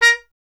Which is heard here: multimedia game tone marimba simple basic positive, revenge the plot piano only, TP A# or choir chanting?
TP A#